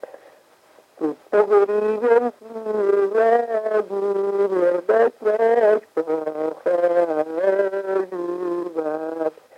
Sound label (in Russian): Былины; Своима́ желтыма́ кудрями да он потряхиват; мезень; Речевой портрет